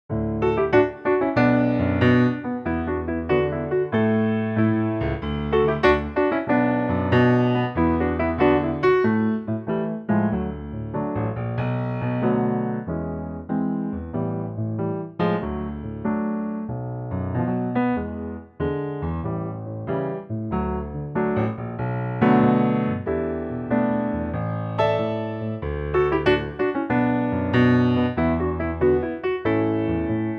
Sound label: D piano; key - D - vocal range - A to A; Lightly swinging piano only arrangement